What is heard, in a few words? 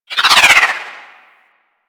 Dodge Roll Right; Movement; Warframe; Archwing